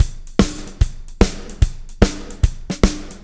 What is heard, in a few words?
POWR, Backbeat, SHUF